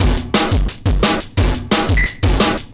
Breaks 175, Drum&Bass; EZ Rollers

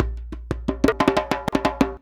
119 DJEMBE, Modern Percussion Loops; USB Soundscan vol.56; AKAI

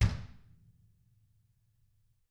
DW, Drums; DW KIT1; FELT

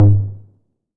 808 LT3 Tape Sat, Tom; Tape808; Goldbaby Tape808 & Tape909

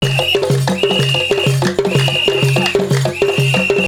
DANCE, ETHNIC JOURNEY, AFRICA; Best Service; Sound Cube

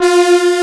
AN SYN, SYN & LEAD; SAMPLE WAVE MIXING 2

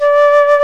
HARPSICORD

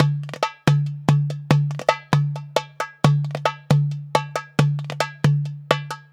DERBUKA; USB Soundscan vol.63; AKAI

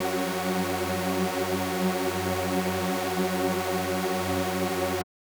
SuperSaw F#4; KG MegaSaur; M8

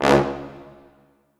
C#1 L, Orchestral Brass

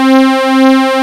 BRIGHT WAVE

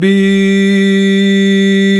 VOX, Male Scats

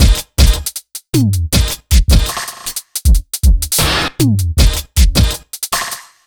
BT; Ragga & Dub Reggae; USB Soundscan vol.27; AKAI

